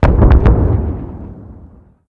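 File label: explosion distant; ModernWarfare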